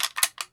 Inf Weapons Foley; Red Orchestra Ostfront 41-45

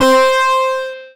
ui race start long; user interface

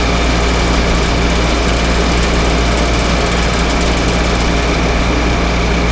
ac unit window loop; Modern Warfare

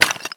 run tile; Escape from Tarkov

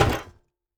reactive target deploy; Rust

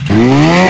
snowmobile rampup, vehicles

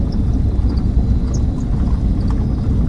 morava cockpit, engines; War Thunder

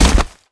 spear pull out; Rust

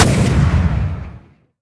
ZU 23 4 End; Weapons; DCS

